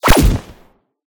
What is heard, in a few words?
Tackle